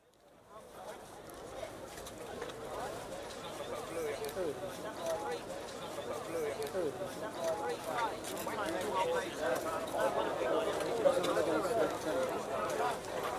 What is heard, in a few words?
National Hunt Racing, general course atmosphere with some bookmakers & papermen, chinks of coins; Miscellaneous Sport; Recorded before 1989 Cheltenham Gold Cup; BBC